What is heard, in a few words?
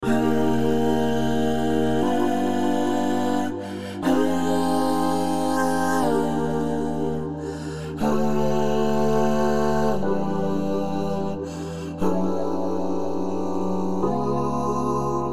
Rough vocal with VariAudio correction and automatically generated harmonies from Chord Track